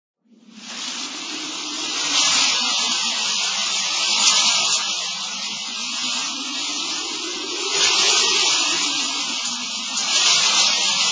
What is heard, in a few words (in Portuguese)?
Vinheta Efeito Chuva E Vento